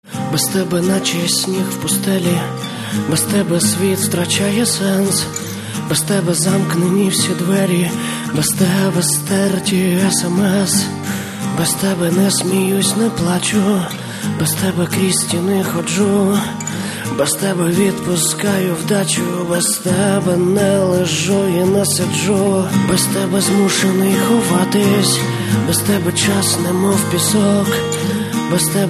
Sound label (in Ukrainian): akustychny